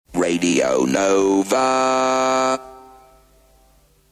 nova Electronic, Jingles

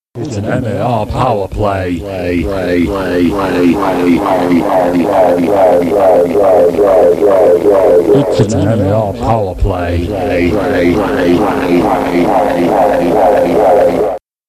jingles; powerplay

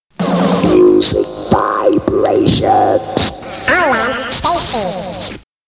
Jingles

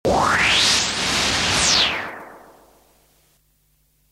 Stinger

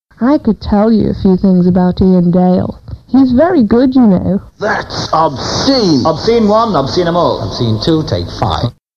Jingles

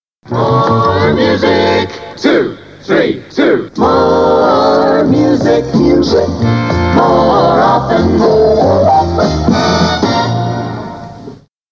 Jingles

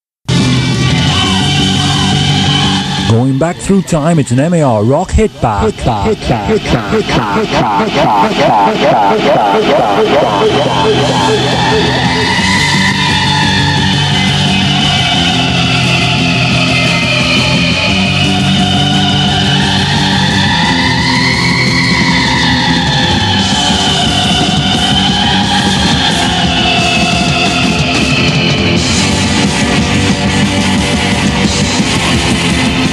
jingles, rock hitback

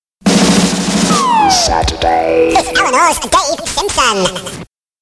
Jingles

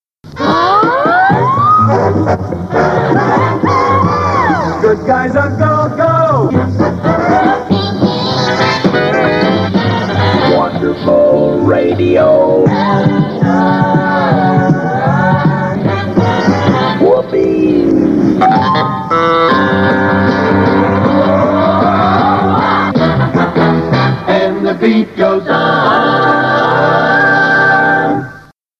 Jingles

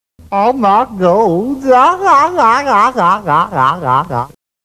jingles; Steptoe; MAR Jingles; Original MAR Jingles

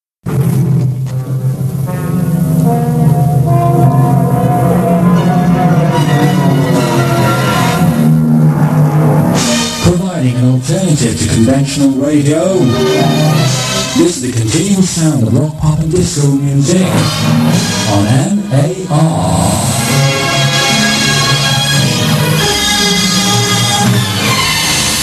Jingles - Rock Pop Disco; MAR Jingles; Original MAR Jingles

